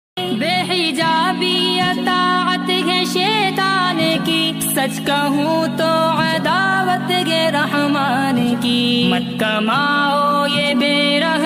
naat ringtone, islamic ringtone

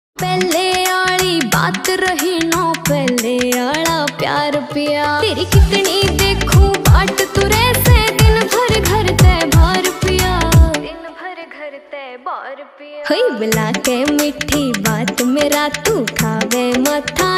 haryanvi ringtone

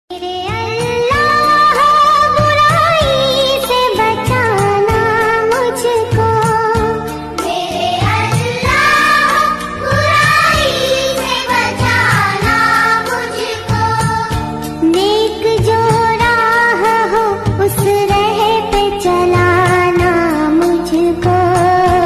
islamic ringtone

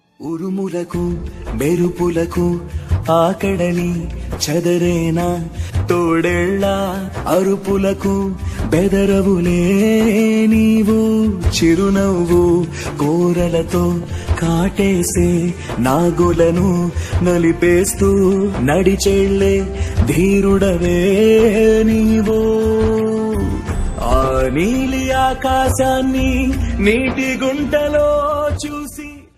telugu ringtone

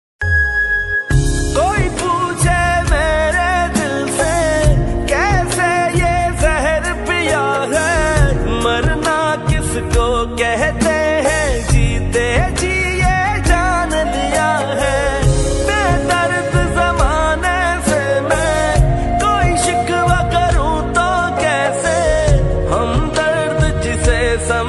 Pakistani sad ringtone, Pakistani OST ringtone

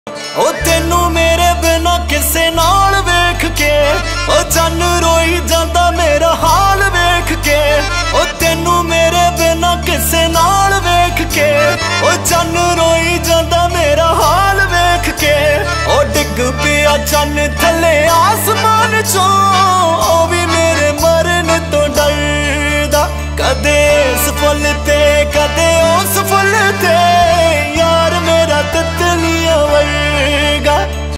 male punjabi ringtone

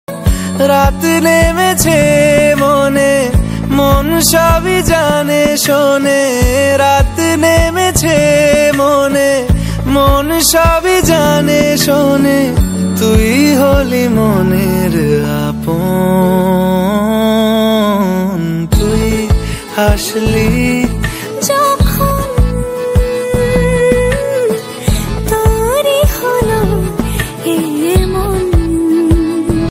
bengali ringtone

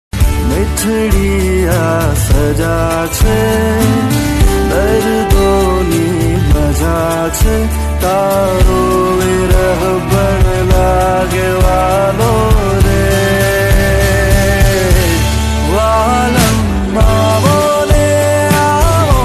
gujarati ringtone

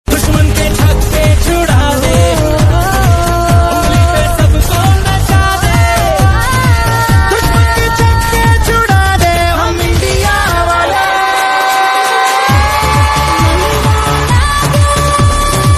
Dance Medley hindi ringtone

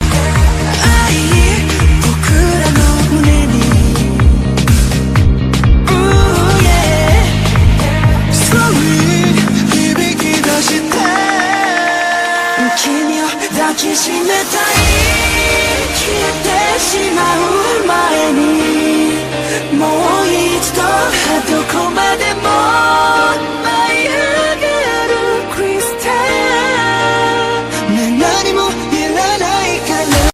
korean ringtone

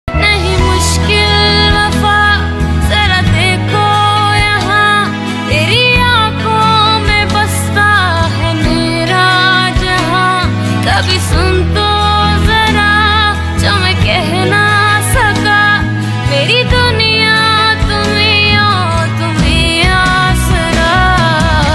female ringtone

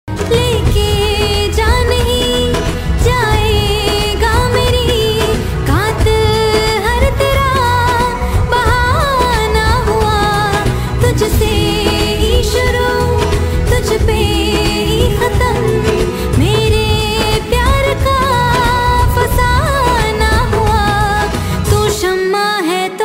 female version hindi ringtone

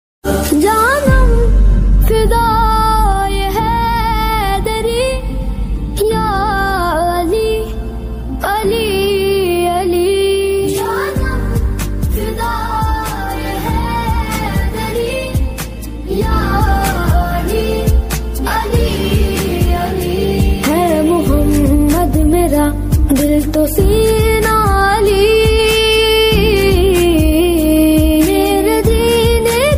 islamic ringtones

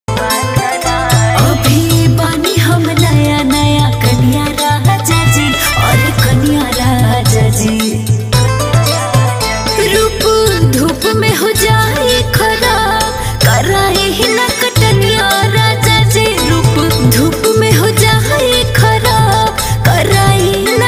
bhojpuri ringtone

